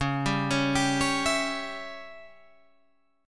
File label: C sharp Diminished C sharp, Guitar Open G; down; chord shape